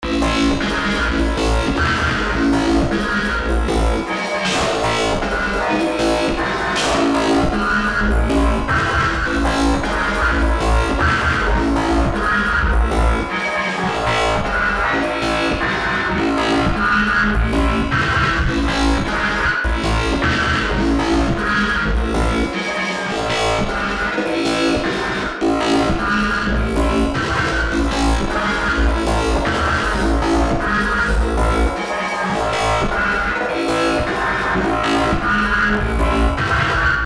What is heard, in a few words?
Loop